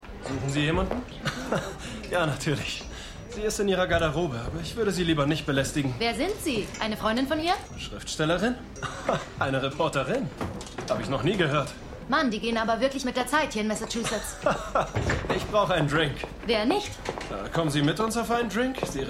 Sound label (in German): Opernchoreut in; Stimmproben